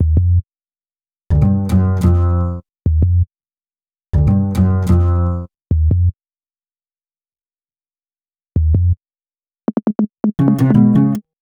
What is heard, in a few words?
Bass, Hip-Hop